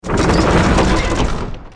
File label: open door